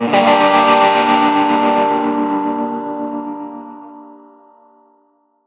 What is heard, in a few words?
Surf Guitar